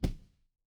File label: impact punch